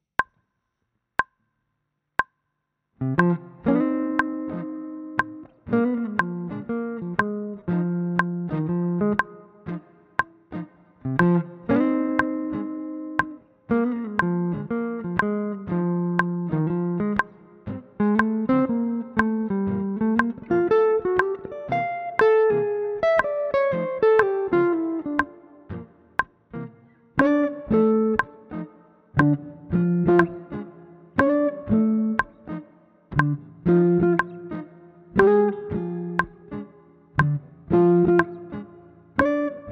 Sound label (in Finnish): blues metronomi 2 ja 4 iskuilla